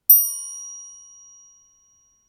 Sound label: chime